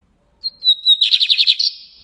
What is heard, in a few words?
Bird